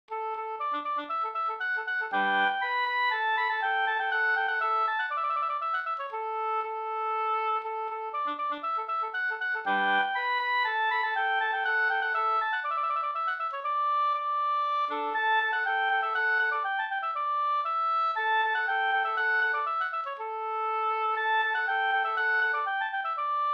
polska Bingsjopolska; Stegen e Pekkos Per

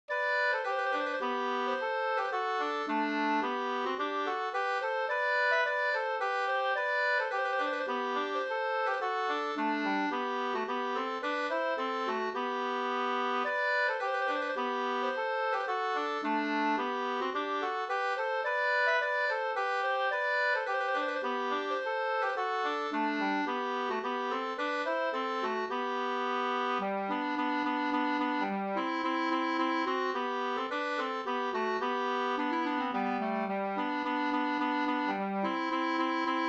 polska; Sd 1814

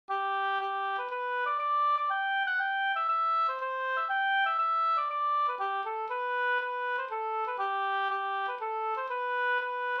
vismelodi bröllopsvisa; Vingaker

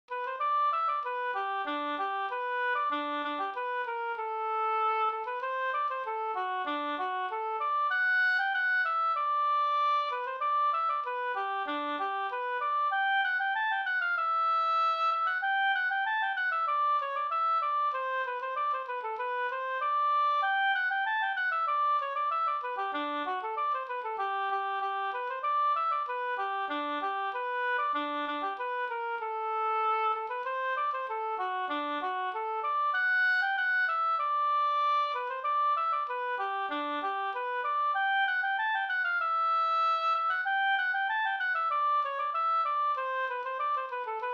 snoa

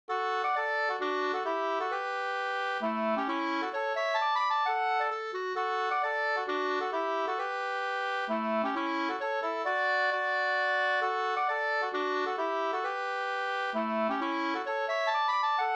hambopolska, 2st

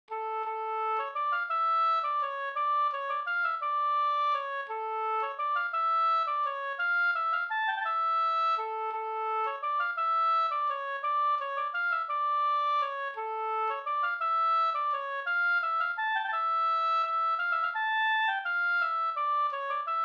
vals Brudvals

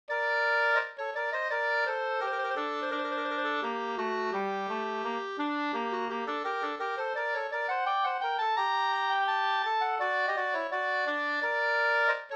brudmarsch; Trollens brudmarsch av Pelle Schenell, Gnarp, Hälsingland; med andrastämma av Herbert Jernberg

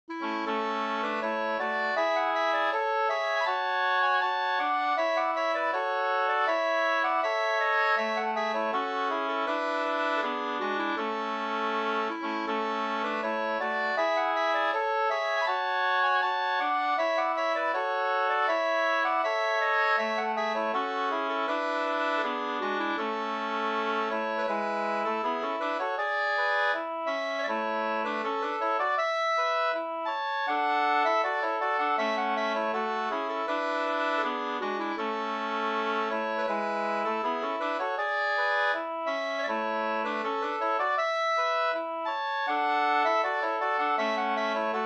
brudmarsch A-dur

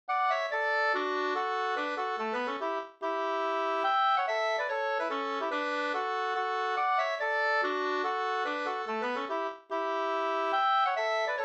schottis; Spel-Gustafs schottis e Gustaf Lindberg Vs 2st

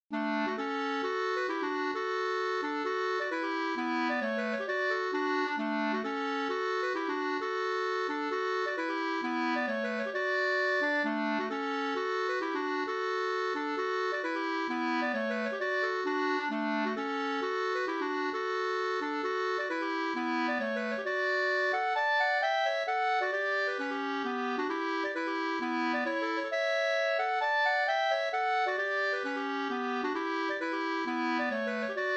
pols Rorospols

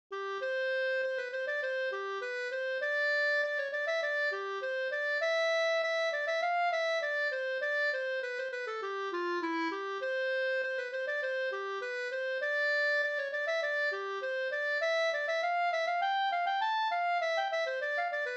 ganglat; Mungalaten fr Up

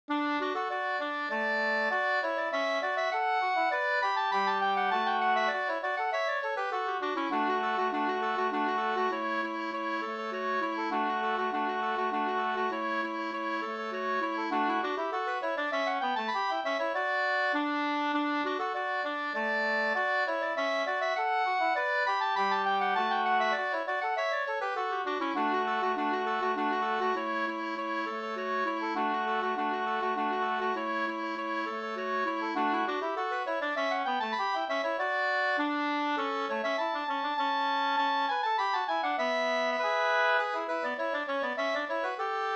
slangpolska 2st

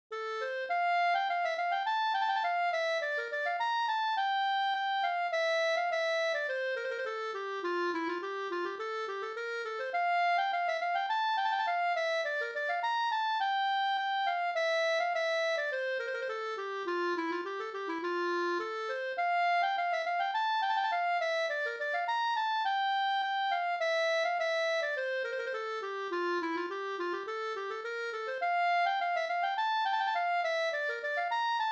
brudmarsch

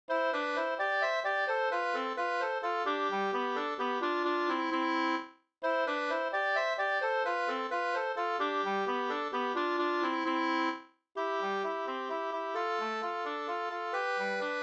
polska

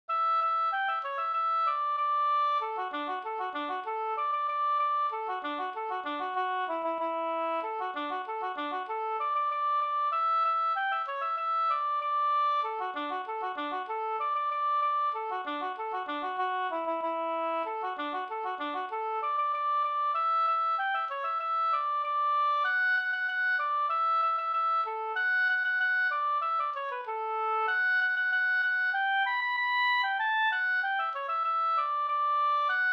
folkdans, Sexmanengelska fi; fr Finstrom Svartsmara

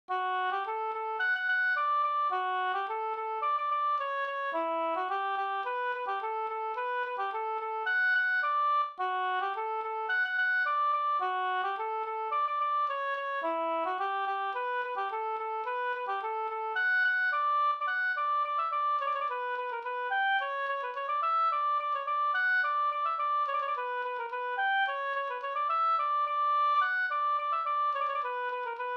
polka, D dur

